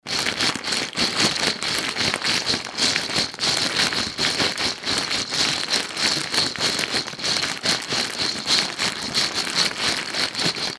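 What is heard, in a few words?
sound of rustling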